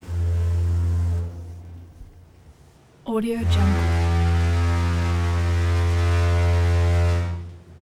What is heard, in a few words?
ship horn interior